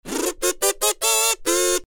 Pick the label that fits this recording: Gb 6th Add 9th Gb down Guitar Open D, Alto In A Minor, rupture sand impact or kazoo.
kazoo